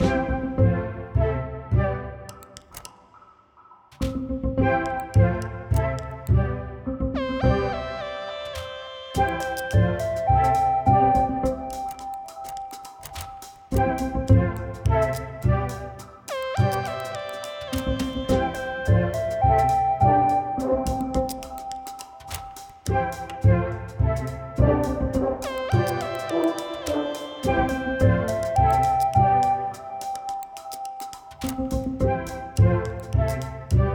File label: Loop All 105bpm; jenny leclue detectiv